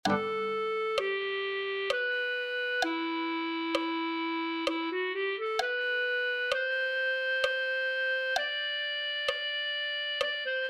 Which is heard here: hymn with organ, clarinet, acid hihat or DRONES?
clarinet